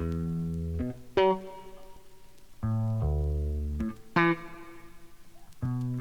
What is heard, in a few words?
bass one funky line, loops; Phonky